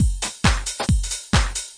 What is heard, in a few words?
135 bpm, thin house, drum loops and breaks